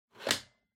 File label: Vacuum Cleaner Battery Insert